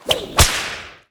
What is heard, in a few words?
Attack Whip